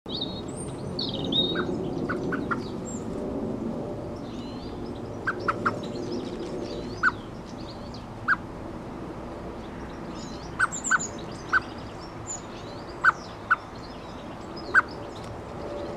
Turdus merula female, صدای توکای ماده برای جلب جفت نر